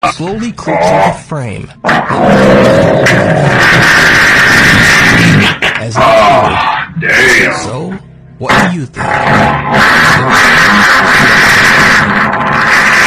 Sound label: fart on my roommates door